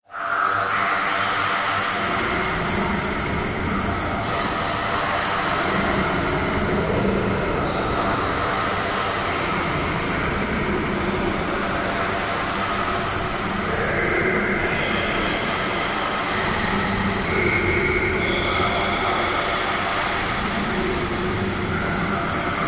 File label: Soundscape Martian Ambience; Science Fiction Sound Effects